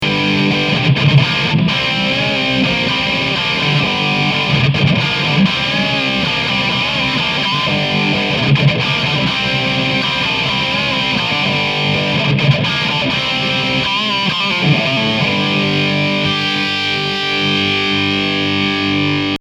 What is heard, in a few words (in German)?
Gitarre